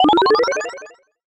general fanfare

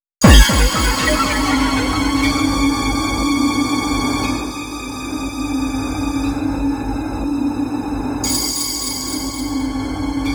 Shock

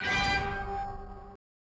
Boot; Mr. Driller; Namco System 12; arcade

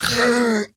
Zulac Recoil

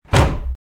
Door Shatter; backrooms 2d android ios online windows gamerip

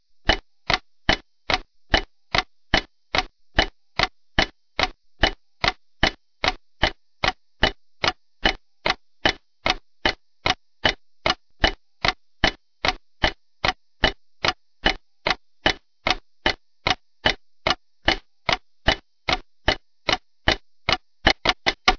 Clock; Fireboy and Watergirl

